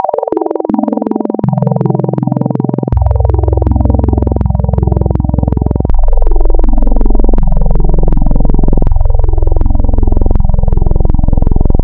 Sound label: Add BinAdd Arth, wavetables Additive; Architecture 32